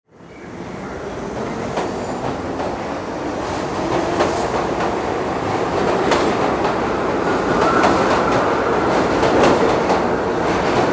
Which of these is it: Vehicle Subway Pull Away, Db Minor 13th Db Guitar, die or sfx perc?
Vehicle Subway Pull Away